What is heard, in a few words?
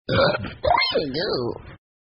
Burp